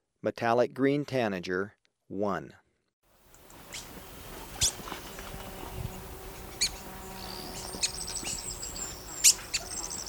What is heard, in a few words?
Metallic-Green Tanager, Birds of Ecuador; S. America